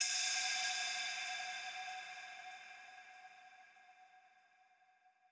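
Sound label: Reverb Cymbal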